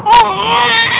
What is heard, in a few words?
crying, baby cry